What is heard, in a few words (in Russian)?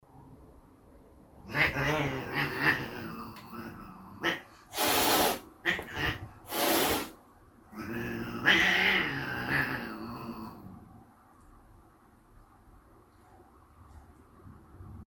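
кот отбивается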